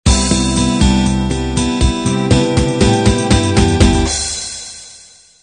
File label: standard 8 beat intro